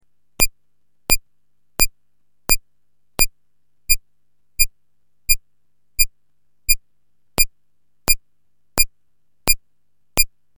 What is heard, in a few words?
env click, synth